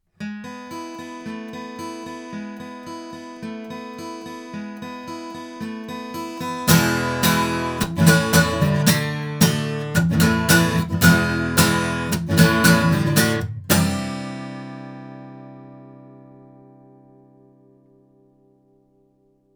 guitar, microphones recordings

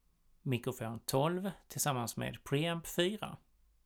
mic pre

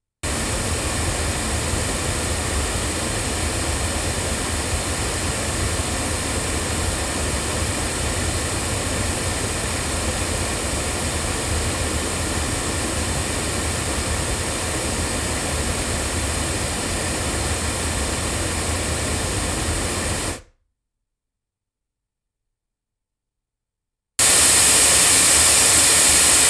MXL 603S loudspeakers

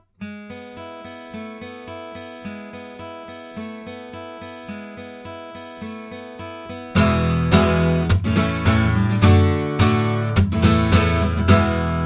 guitar Gibson; R1; GoldenAge

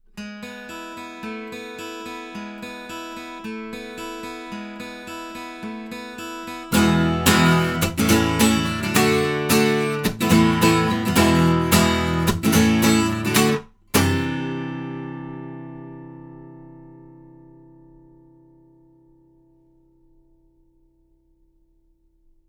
omni guitar Gibson; FC3; GoldenAge

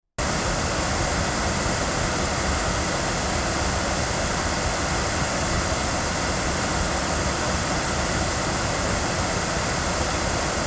JZ BlackHole SE loudspeakers, microphones

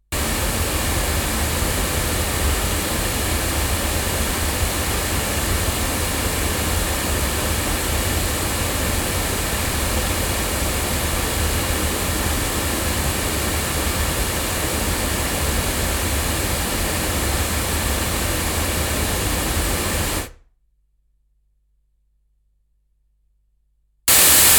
loudspeakers; microphones; violet designs

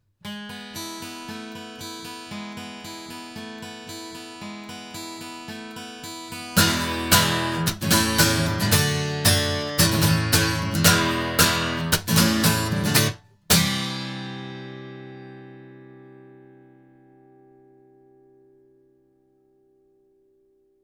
Golden Age TC1 8 guitar

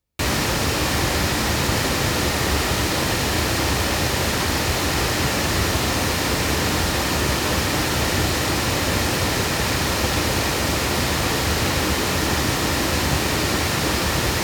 loudspeakers; CT Soundstage Image; microphones; Crowley Tripp

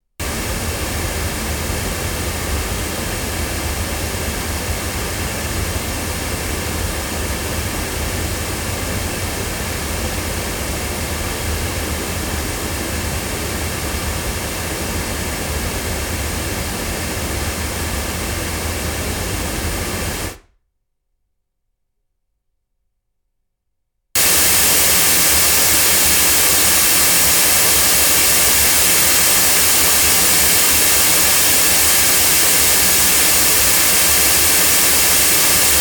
loudspeakers; LineAudio SM6i; omni; microphones

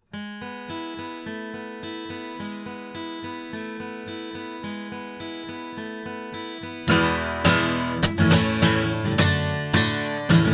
sE 2A cardioid guitar, microphones; se electronics

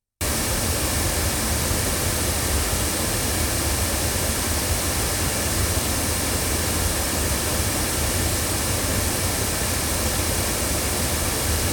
Milab VM-44 loudspeakers

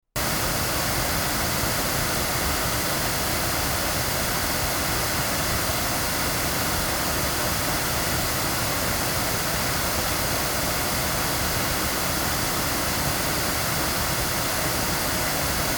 Avant CV-12 tube 8 loudspeakers

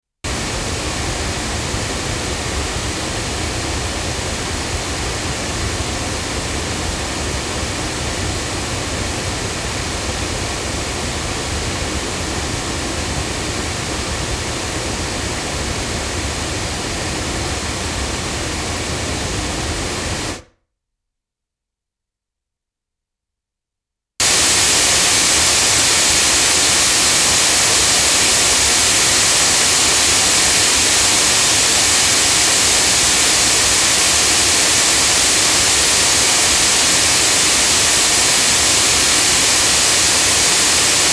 Royer R-121 back loudspeakers

